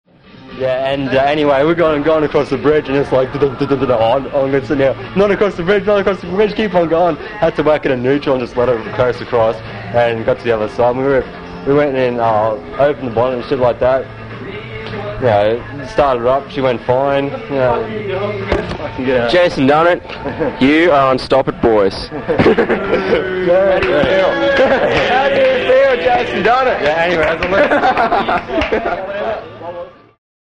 car

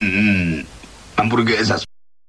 mmmh

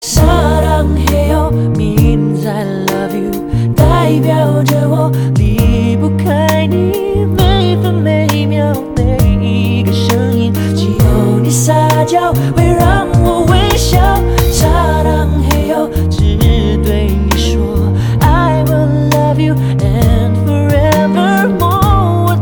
ringtone

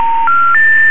Warning

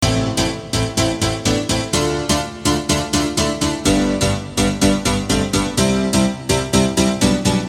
Piano Loop

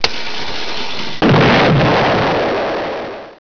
bomb

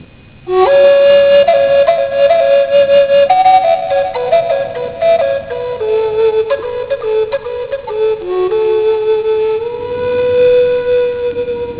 Flute Thunder

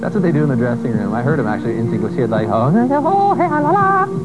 singing

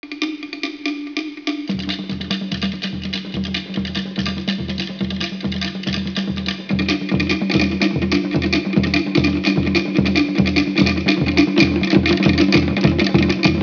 drums

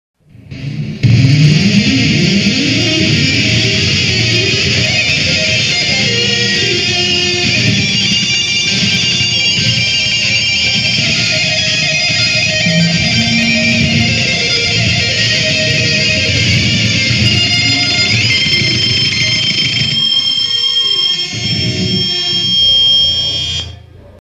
solo; Matt Playing

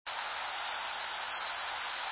warbler Black-throated green raspier; birdsongs; Pequannock Watershed, top of Clinton Reservoir at P3 Parking Area in canopy of Hemlock Grove along Mossman's Brook; raspier version of song, 4/30/03

